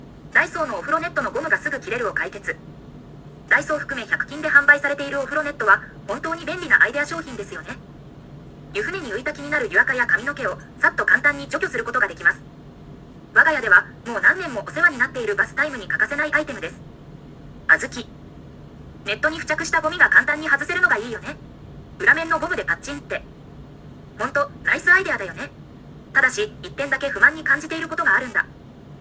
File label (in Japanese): スピーカーからビビり音